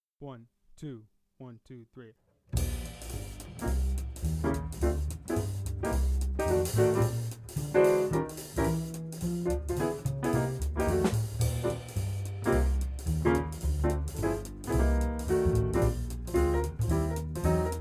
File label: Passage Play-Along, Saxophone; Jazz Conception For Saxophone 21 Solo Etudes (Eb Saxo)